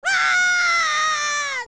young scream; Link screaming during a big fall

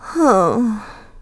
Stylish Woman Sigh; sighing

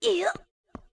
Link Ledge Climb; The Wind Waker; Link climbing up a ledge

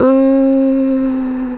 Sigh; Uli sighing about her lost baby cradle; Uli (Colin's mother)

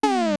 Pause Close; LTTP; Open and close the pause menu

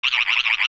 Cackle; Guay

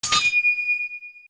Crystal Switch; zelda; OOT

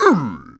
Swing; Moblin; zelda sounds

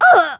Hurt; The Lady getting hurt

